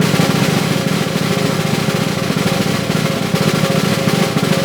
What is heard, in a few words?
SNR ROL, Synclavier Percussion 2 - World & Orchestral; ILIO